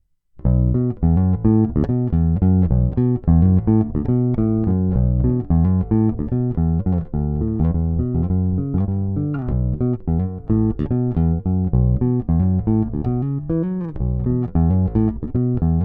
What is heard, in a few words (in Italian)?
Dita tono aperto